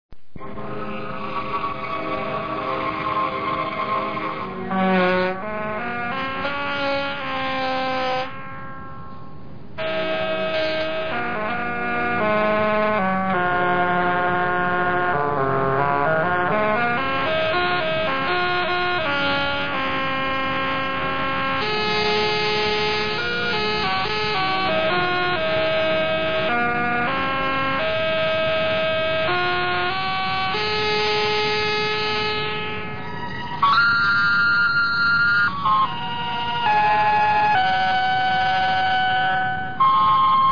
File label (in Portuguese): orgao